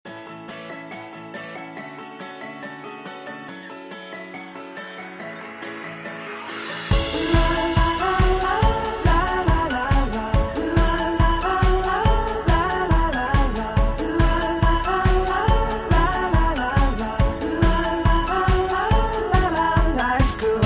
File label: Tune; LG; X335